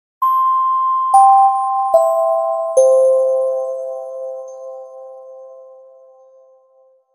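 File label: information chime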